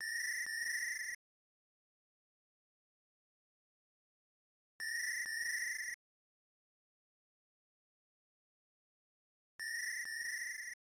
Crickets; Final Fantasy; PS1 + PS2

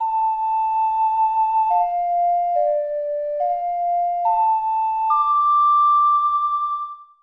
Ocarina; Legend of Zelda, The : Majora's Mask

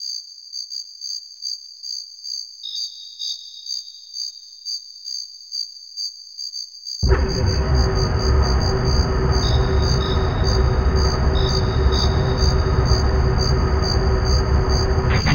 Departing (SFX); Jet Force Gemini; Departing from Goldwood

